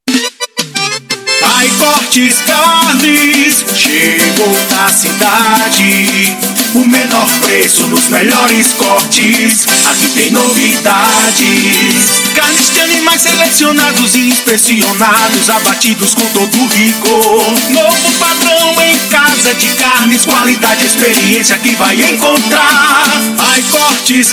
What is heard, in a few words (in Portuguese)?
Jingle, jingles comerciais